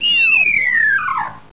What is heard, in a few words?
chum toad die